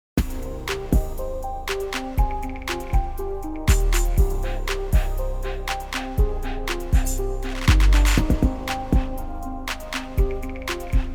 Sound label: too much 200 hz